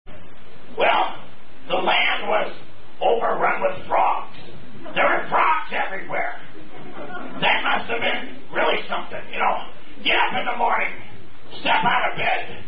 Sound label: Singing Tom The Toad